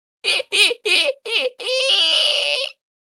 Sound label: hehehehheh